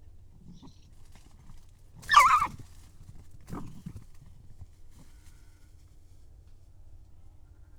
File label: red fox vulpes vulpes